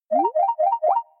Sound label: High Score; Teams